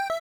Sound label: turnoff; jira credits